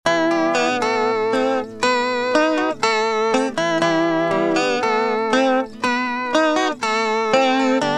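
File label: acoustic guitar clone theory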